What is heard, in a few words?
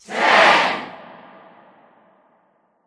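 Ambience Tennis Crowd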